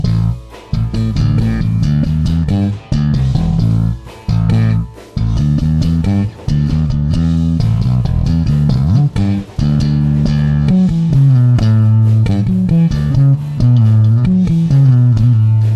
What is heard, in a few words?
bass